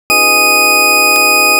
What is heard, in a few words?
D7 majeur, synth